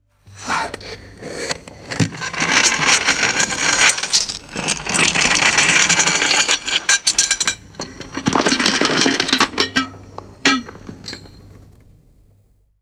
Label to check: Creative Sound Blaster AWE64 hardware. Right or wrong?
Wrong